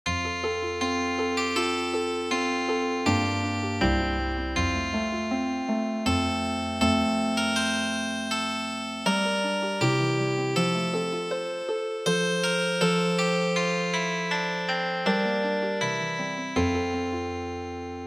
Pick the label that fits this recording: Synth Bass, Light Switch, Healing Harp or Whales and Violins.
Healing Harp